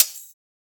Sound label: Closed Hat; Ghosthack Advent Calendar 2017 Day 5